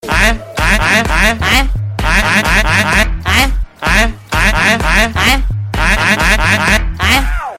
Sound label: music